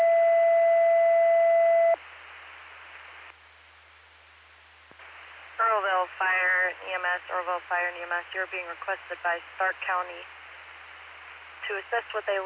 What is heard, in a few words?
Fire; Earlville; TTDAlerts